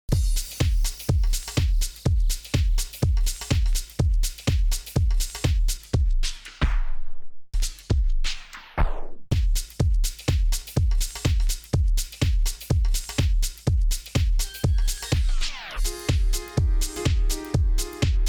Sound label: Novation Twitch Braker